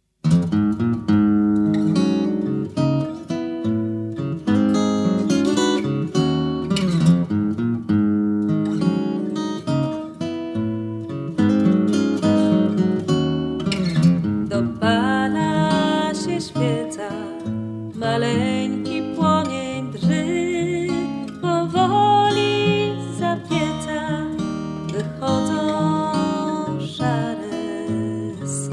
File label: Blues